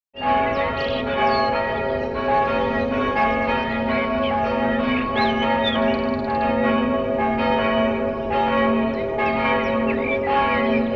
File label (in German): Glocken von St Ursula Lipp; Seelsorgebereich Stadt Bedburg